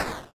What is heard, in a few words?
explode Short